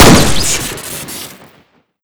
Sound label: derringer shoot crit; tf2classic